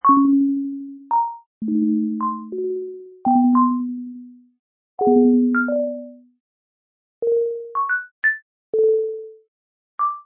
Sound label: chimes delay; nyquist